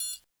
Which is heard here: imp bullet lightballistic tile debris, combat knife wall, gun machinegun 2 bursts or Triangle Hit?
Triangle Hit